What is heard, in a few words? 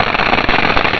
tick